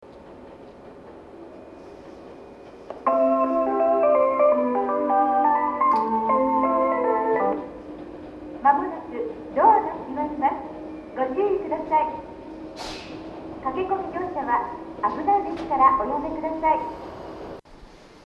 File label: 3 melody, station; kitasenju; joban; jreast